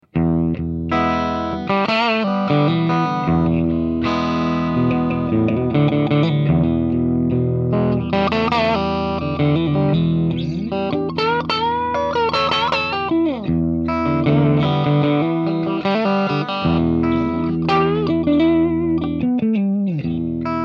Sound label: strat normal vol, amps; Normal Channel; with Volume at 3 o'clock; gypsy